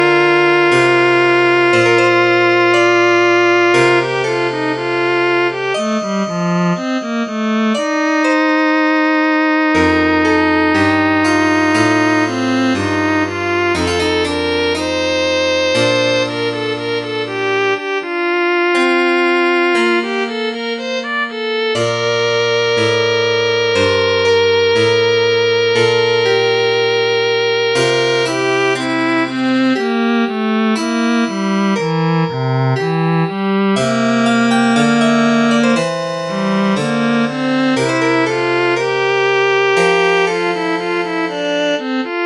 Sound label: viola; INSTRUMENTOS MUSICAIS